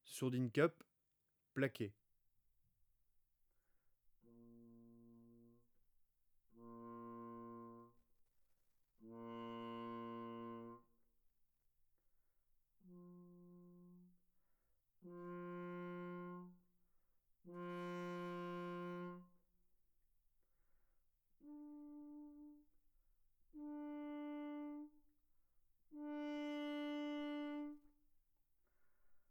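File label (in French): sourdine cup plaquée, trombone; Sourdines; Sourdines fixees; traite orchestration; trombone tenor trombone basse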